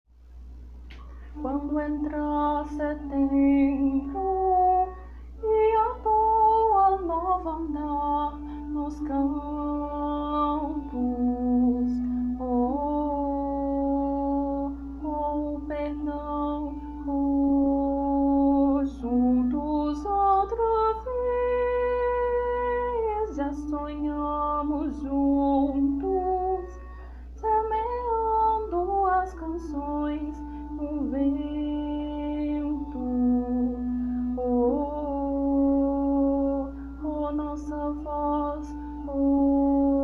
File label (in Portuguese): contralto